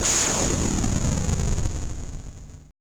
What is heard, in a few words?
AMBI FX